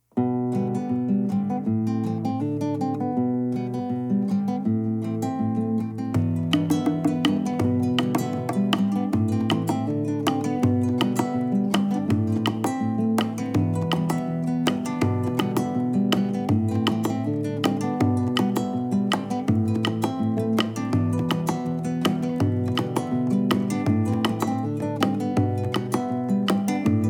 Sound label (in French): accompagnement seul; Accompagnement instrumental; le lionceau; français